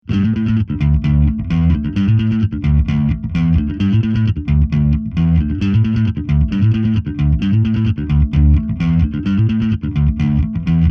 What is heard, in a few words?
Bassman 300; fender